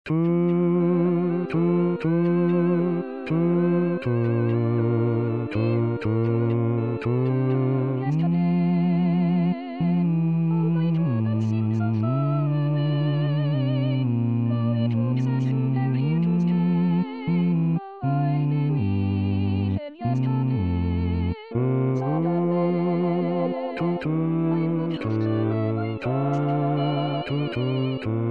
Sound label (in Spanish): bajo